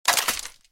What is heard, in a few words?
M60 Open; Criminality